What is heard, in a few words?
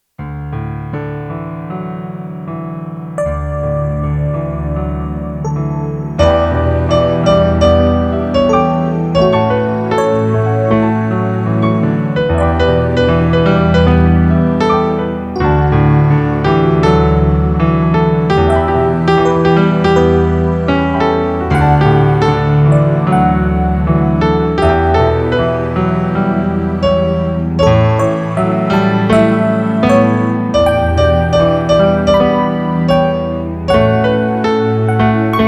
Accompaniment